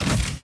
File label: mechanical large inventory pickup; factorio 2.0.55